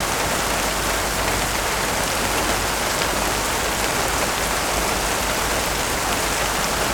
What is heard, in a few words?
Infection Rain Loop; ambience